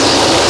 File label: Winter games